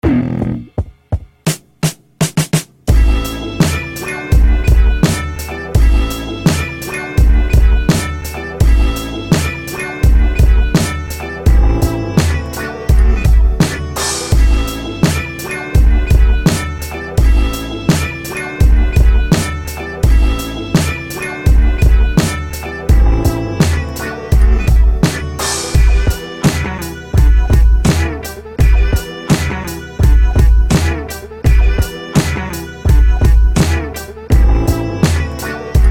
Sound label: bass